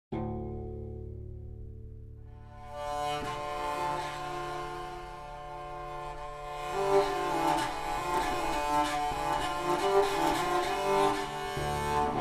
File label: Instrumental, edad media; CSM 206